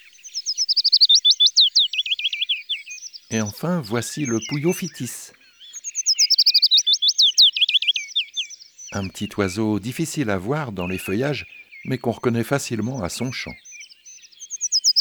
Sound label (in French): Pouillot fitis ABC des chants d'oiseaux